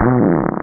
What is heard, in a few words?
bottie burp